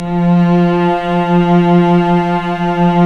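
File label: cellos, vibrato, mezzo forte; String Master Series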